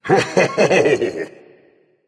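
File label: non verbal fear, voice; cernunnos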